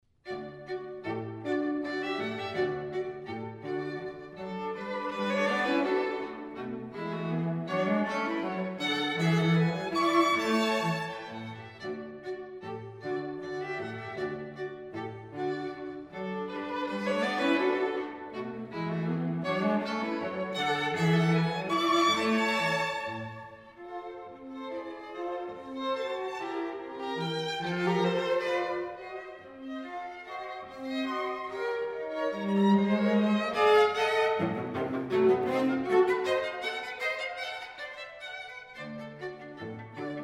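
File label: string trio; rontgen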